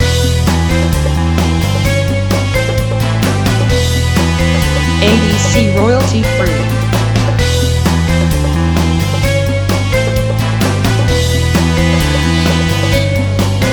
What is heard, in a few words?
spy loop